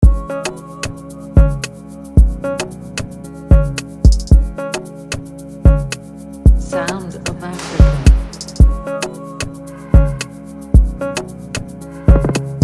Freebeat Afrobeat; Prod By SoundofAfrica

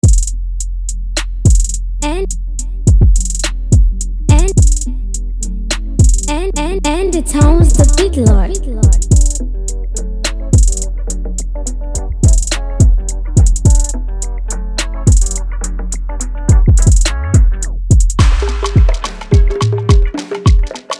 Freebeat; Prod By Endeetone

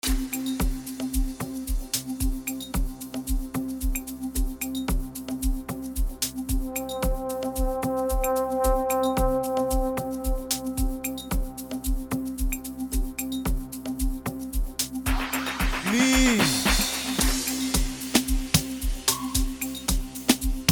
FREEBEAT, amapiano type

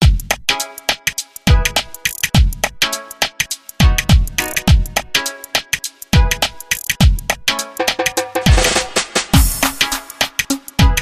Freebeat Afropop; Shalzbeat

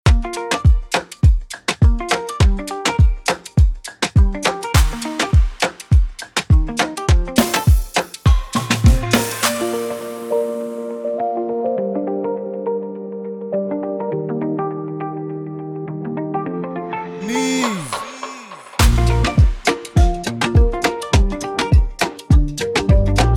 afrobeat freebeat

